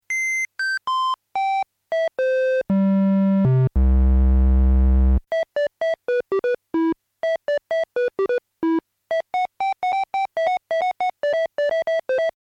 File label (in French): FM DX7 analo carre, synth